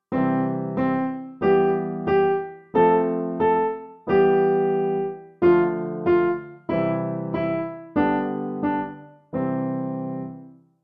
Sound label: twinkle